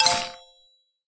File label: Cat launch boost invincible